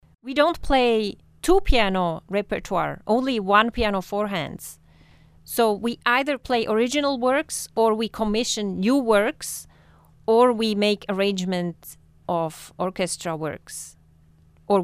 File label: one piano four hands